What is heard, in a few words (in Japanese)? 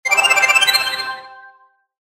回復魔法 大; モノラル; 魔法効果音